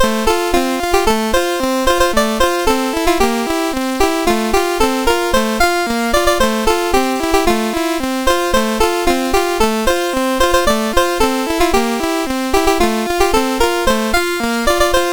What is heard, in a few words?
Banjo, MOS6581R2